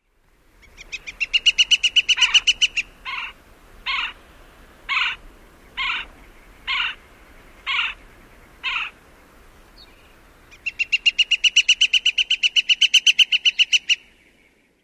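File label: kikikiki and querr calls, bird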